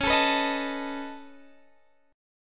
C sharp 7th Suspended 2nd Sharp 5th C sharp down Guitar Open G